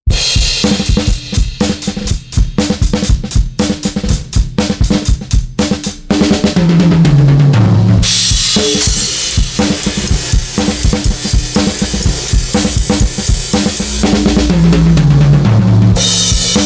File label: drum; Gem Comp 670; MILAN